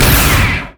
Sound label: crit attack; lucian skin01